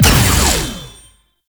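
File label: Stab Attack; Enemies; Abilities; Warframe; Tau; Dax Ninja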